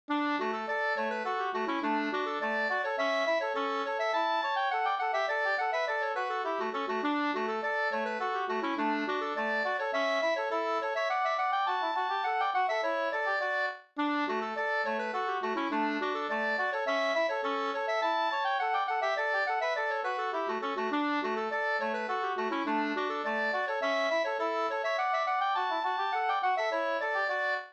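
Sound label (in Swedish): slangpolska, two-part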